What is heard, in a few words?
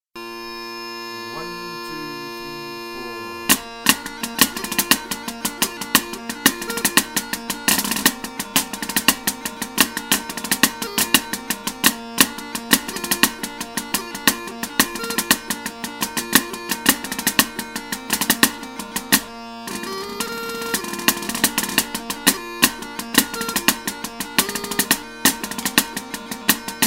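Donald Camerons Powder Horn Intermediate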